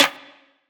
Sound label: drop one shot snare